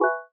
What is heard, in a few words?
Announcement; ScreenReader